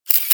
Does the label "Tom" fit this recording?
No